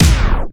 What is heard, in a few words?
dj disco effect 1beat